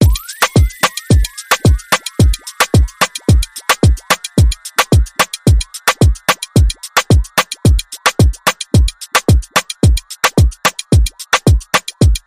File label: Beat